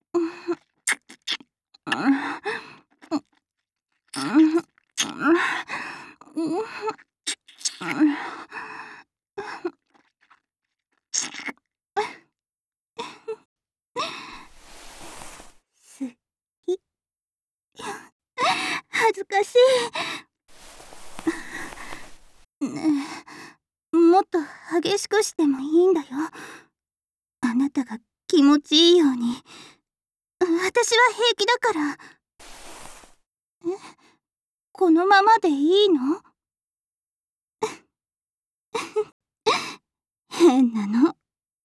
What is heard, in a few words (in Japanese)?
長く優しいキス